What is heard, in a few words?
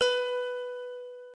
B Guitar; Notes; Scratch